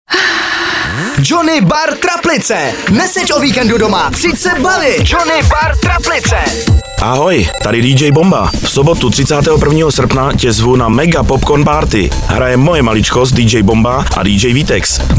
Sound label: jingle popcorn party